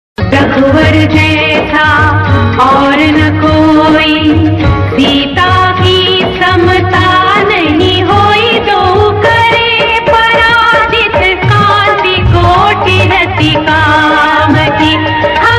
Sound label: hindi devotional ringtone